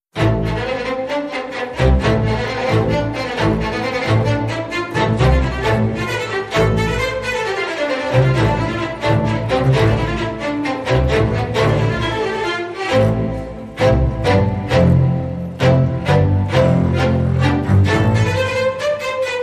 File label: Strings Solo